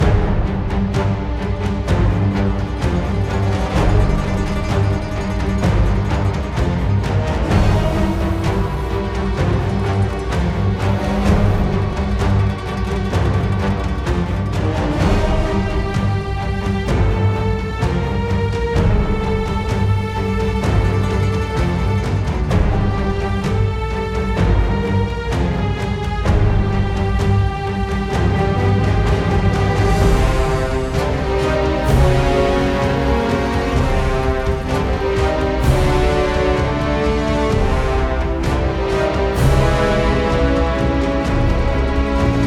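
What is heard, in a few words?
background music battle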